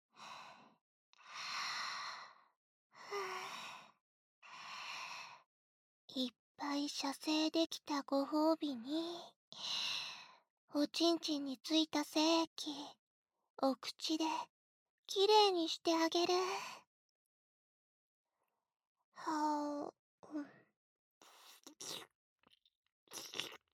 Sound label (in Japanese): お掃除フェラ フェラ 角コキ 耳舐め 耳舐め鎌コキ 耳舐め足コキ; SEなし